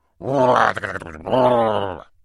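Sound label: sounds of voice of caveman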